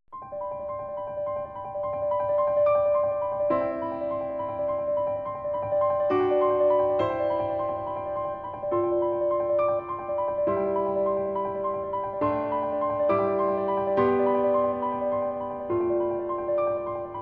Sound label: Nocturne C Major